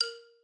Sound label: xylo